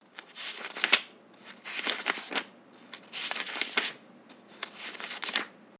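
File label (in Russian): перелистывание страниц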